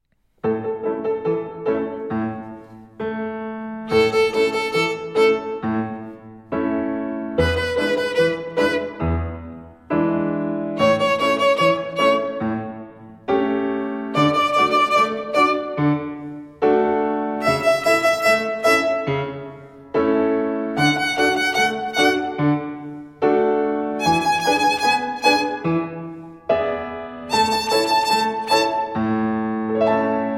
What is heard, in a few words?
The A Major Scale with Rests